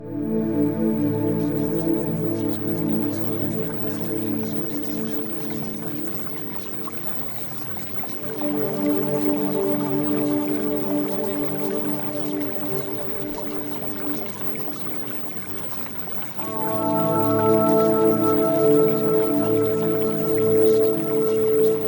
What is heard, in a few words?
Music; Premium Subliminals